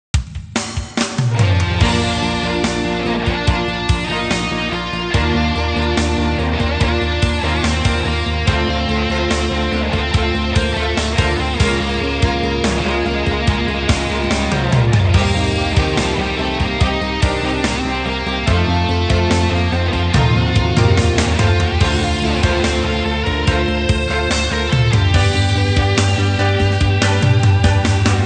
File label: Music Game General event